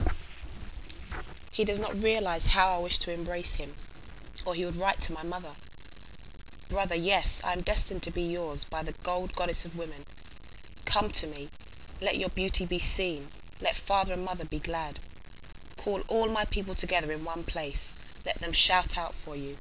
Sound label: song